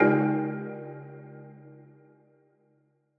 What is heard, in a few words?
Marimba Hit